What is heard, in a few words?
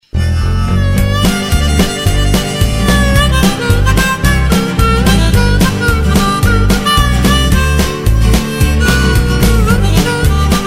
blues